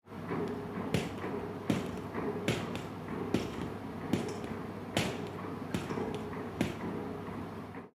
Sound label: Training Fail Ambi; Pitch Black - A Detective's Demise